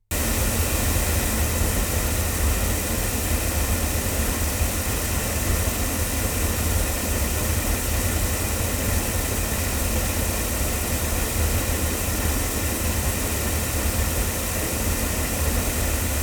ADK A51TL omni loudspeakers, microphones recordings